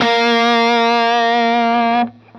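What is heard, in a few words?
fuzz guitar vibrato; MAYFUZZ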